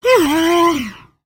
idle, creatures organic

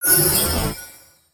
Heal Armor; Kight-samurai

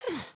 sleep turn; emotes

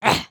Run; Lego Strombie; creatures organic

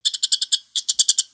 Pets Skunk Happy; creatures; Lego

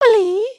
curtsey, emotes

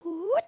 breakdance woot up, emotes

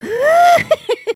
fart

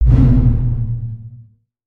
Pillar Shockwave

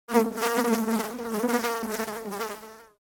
Jungle Insects; gnarled forest

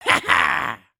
Attack, creatures organic

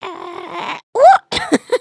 trip, emotes

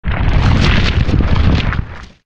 GF Lava Rumbles; gnarled forest

